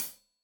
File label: Hat Tight, Hi-Hat, Cymbals; Deeply Sampled Drums